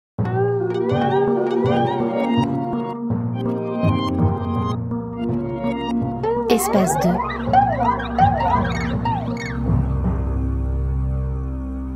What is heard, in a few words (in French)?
timballes; Espace 2